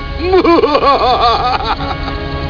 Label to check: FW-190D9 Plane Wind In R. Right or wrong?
Wrong